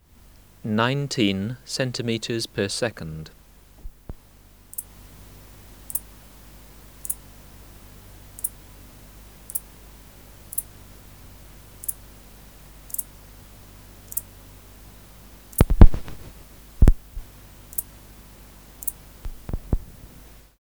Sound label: Steropleurus perezi